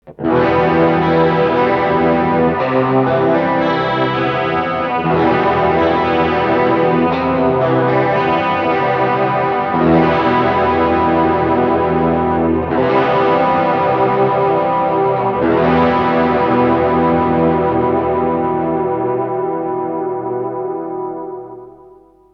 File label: Overdrive Chorus